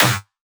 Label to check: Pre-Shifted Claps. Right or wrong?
Right